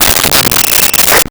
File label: Walkie Talkie Interference; Communications